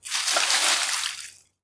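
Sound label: rustling in the grass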